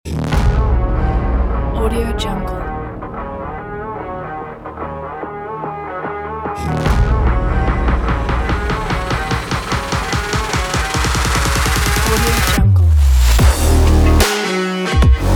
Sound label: fashion sport rock trailer